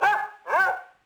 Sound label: Dog Bark; Project Gotham Racing 2